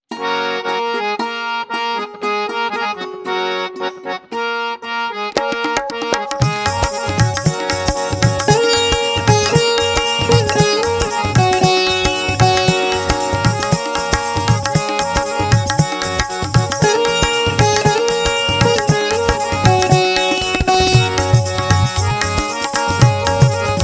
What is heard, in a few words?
Ladies Karaoke F Sharp